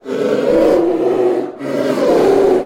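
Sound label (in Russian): рев молодого обиженного сердитого медведя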